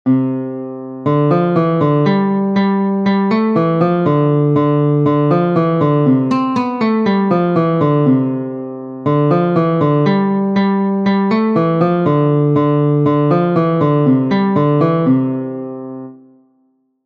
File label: Can Can Polka